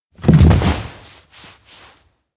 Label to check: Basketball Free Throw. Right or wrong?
Wrong